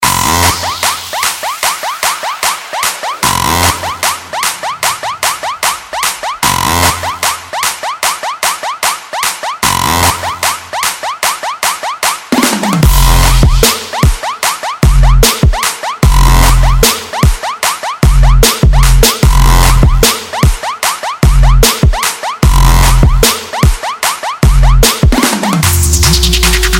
Trap; Trap Sounds Premiere

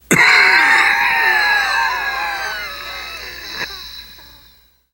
death scream, survivor voice

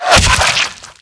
bullet impact, infected gore; npc, bullets

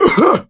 cough, coach; survivor

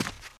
dirt, footsteps walk; boomer

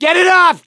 scream while pounced; survivor; gambler

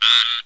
small frog, frog groups, random animals

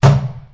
plastic barrel impact hard; physics

